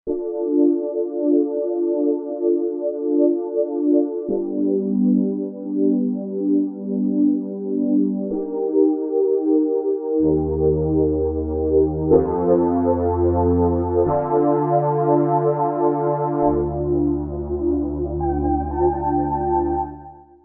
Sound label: Flux, synth